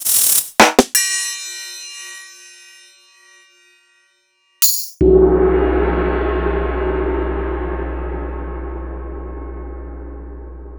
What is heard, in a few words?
Roland SPD-S; m8